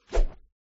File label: Jump